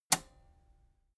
metal button robust spring release

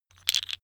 tiles wood

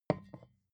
vodka put down tipping

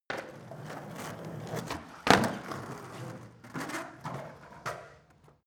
skateboard indoors drive flip heel

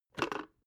water boiler open top

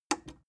plastic button small simple click slow

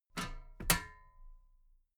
metal ladder step heavy double

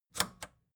record player power click on slow

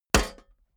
shovel impact

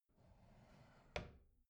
bathroom drawer close soft

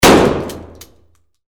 shotgun

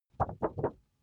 paper wobble swift

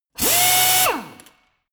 impactor hard burst

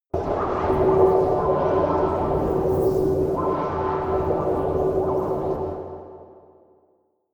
space fabric liquid transport close

